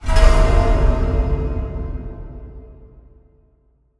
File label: fleefail; curious expedition 2 macos ps4 switch windows xbox one xbox series xs gamerip 2021